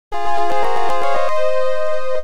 OPL2; ZANAC3; Tyrian

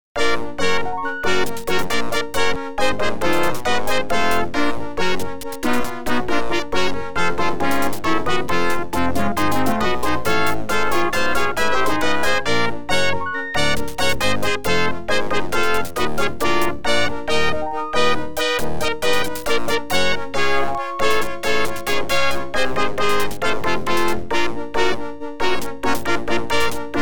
OPL2; Challenge; X WING

